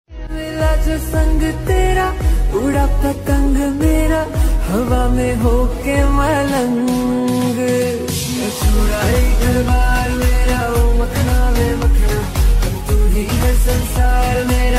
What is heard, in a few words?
Female Version Ringtone